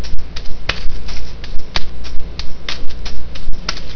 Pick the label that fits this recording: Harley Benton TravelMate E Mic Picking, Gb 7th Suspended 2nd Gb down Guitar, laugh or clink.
clink